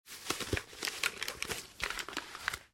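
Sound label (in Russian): звуки сноуборда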